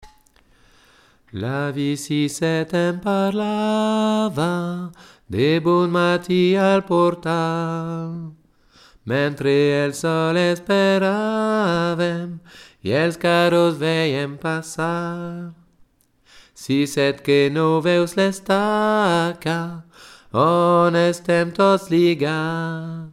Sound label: Lestaca Tenor